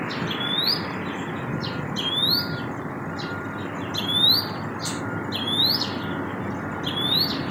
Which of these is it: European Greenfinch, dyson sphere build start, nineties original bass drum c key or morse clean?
European Greenfinch